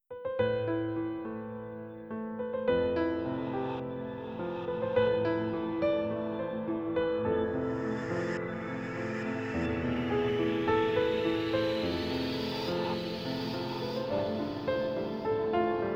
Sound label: Trailer; garageband; Cruising